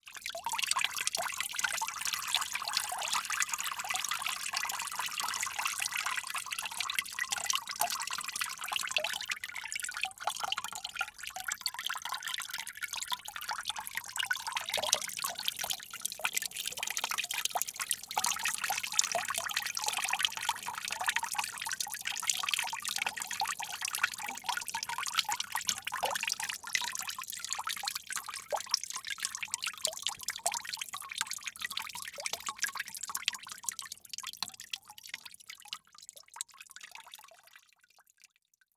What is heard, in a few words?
Liquid Water Dripping Into Water In Plastic Bucket Long Slow